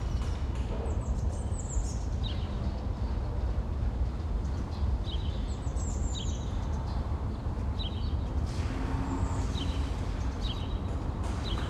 ambience detail, renewal works in old tenant building, changing floor tiles; ORTF stereo; Alexander Kopeikin; Moscow Ambience